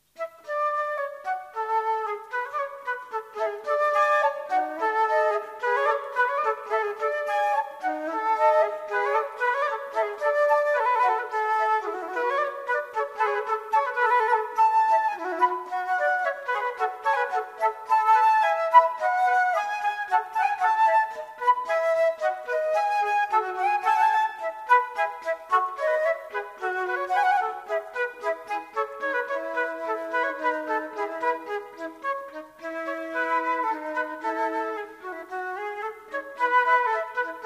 Sonata for two flutes; Telemann; Six sonatas in Canon for two flutes

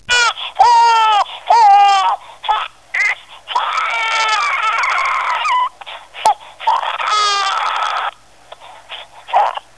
cry

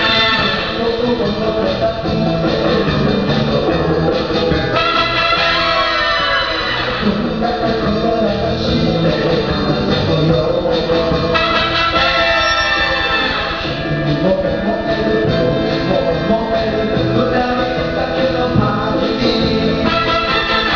bongo

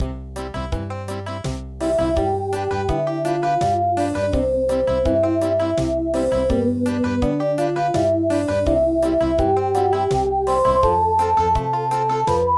Country; traditional

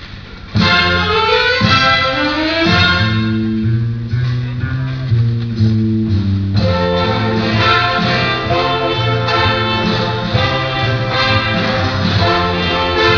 swing

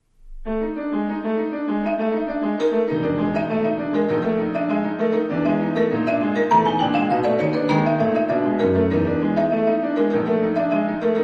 piano

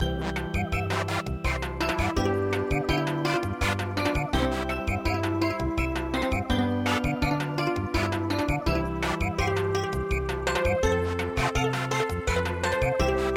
Samba; traditional

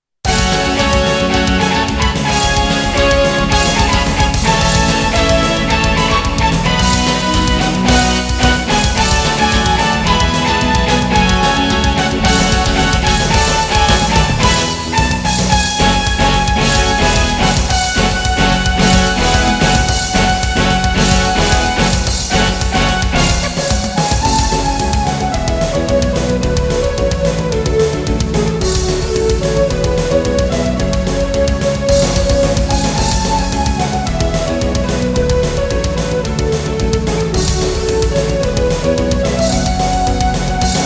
Roar; Roar of fierce animal; 猛獣の咆哮です

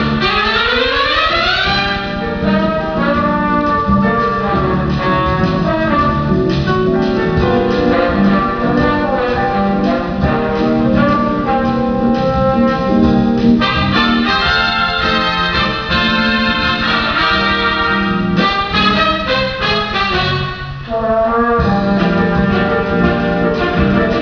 bossa

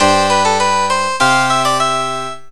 baroque

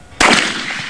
shoot

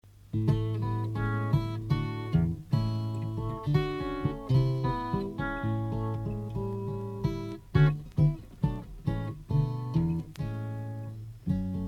instrumental, guitar

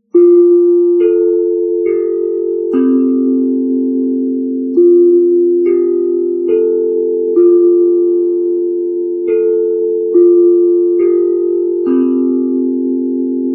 chime; 小学校の授業の開始と終了の合図で使用されているあのチャイムの音です。; GANKという珍しい楽器で演奏してみました。生楽器の演奏なので、多少、ノイズっぽい低い音が入っています。

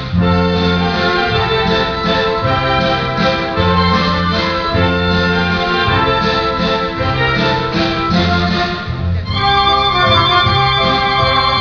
waltz

